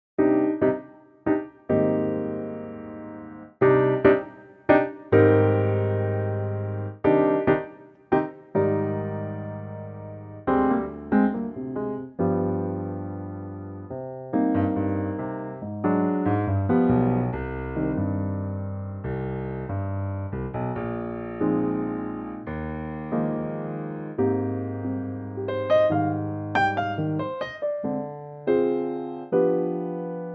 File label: Eb piano